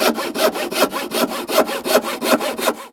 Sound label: Saw Wood; Prison Architect